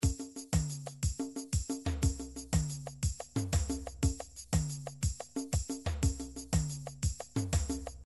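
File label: midi drums african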